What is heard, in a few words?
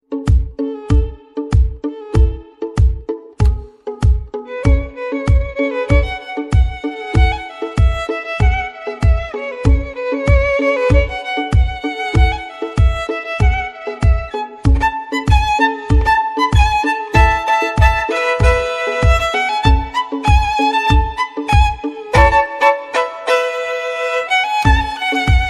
instrumental violin bgm ringtone